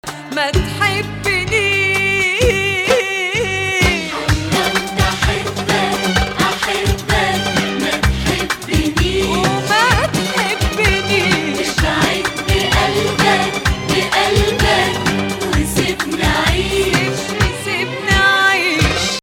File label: Hijazkar Octave